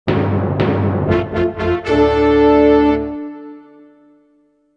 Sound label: Trumpet; Dino Island